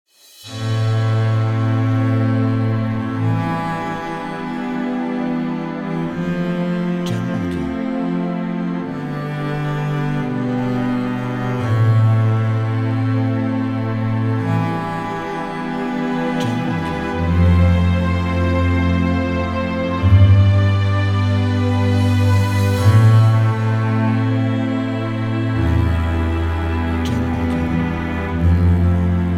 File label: Classical Background